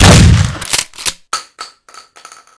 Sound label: REMINGTON870